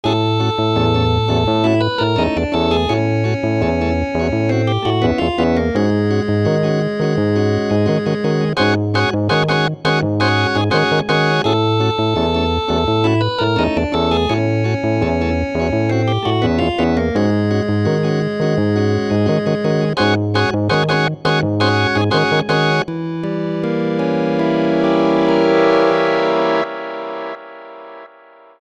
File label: vox